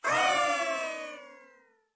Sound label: weeeeeee